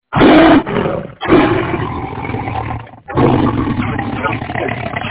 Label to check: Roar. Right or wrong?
Right